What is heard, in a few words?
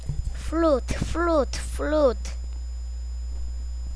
flute